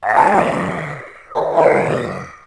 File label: gladiator idle; openffa